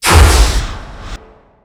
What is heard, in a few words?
emp discharge; weapons; dota; invoker